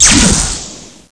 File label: teleport out; Ferazel's Wand Demo